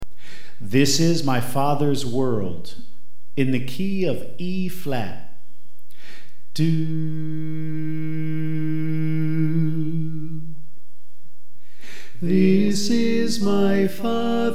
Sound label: Tenor